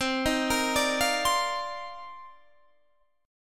C Minor 11th C Guitar Standard; arpeggiated; JGuitar

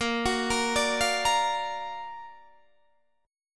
F sharp Augmented Major 7th A sharp, chord

